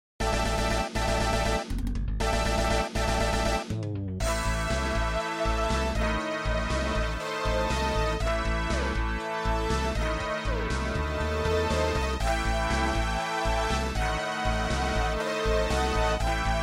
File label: Midi Battle; Shining Plume 2 Windows gamerip 2017